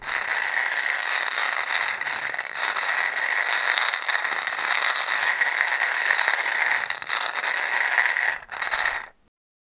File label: grinding beans in grinding, grinder